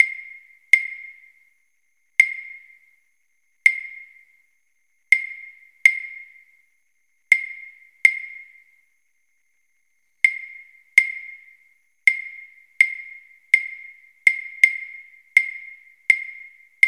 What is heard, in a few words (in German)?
Rhythmus Grundlagen schnell 82 Bpm